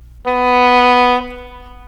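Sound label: oboe Low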